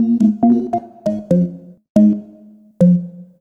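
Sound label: Lead Jazz organ, Drum & Bass Keys; Sonic Foundry (Sony Creative Software); Knutrix Drum & Bass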